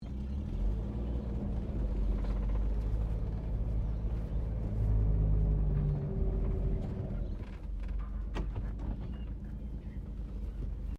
CAR HOLDEN FE INTERIOR REVERSE GEARSHIFT, Classic Australian cars; Vehicles; SoundEffects-Foundation1